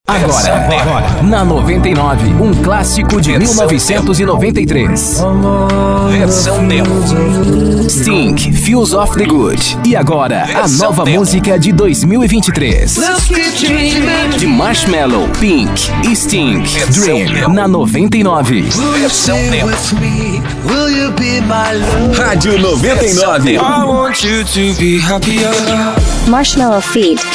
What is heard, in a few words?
intro music